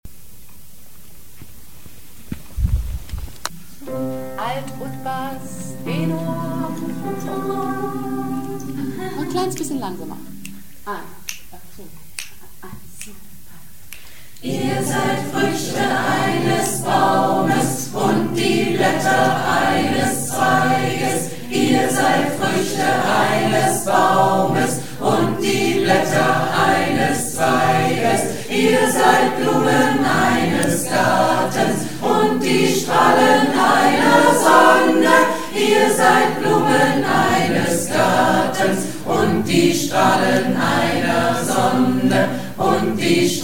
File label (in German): tutti